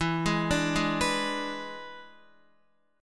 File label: E 7th E down Guitar; E7 arpeggiated; JGuitar